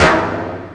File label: tom3, drums